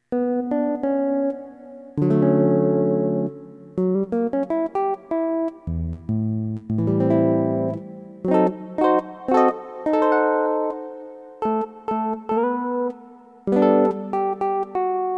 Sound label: Jazz Guitar